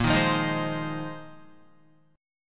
Bb 9th Suspended 4th Bb down Guitar 4F,4A,5C,5G,5C,6E; strummed; JGuitar